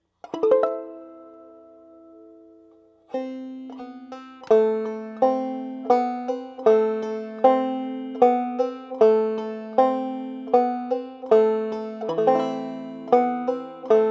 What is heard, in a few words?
carol of bells slow, banjo; Christmas